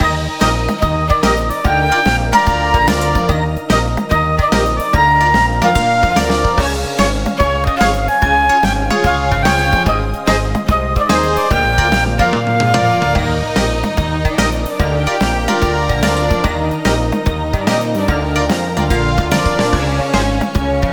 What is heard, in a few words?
Loop Happy, soundtrack; Baboon